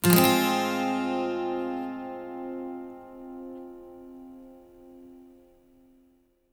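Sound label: 12 string minor, Acoustic Guitar Loops; USB Soundscan vol.04; AKAI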